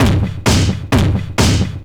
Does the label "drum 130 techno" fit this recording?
Yes